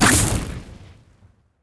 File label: bow flame; matmos interactions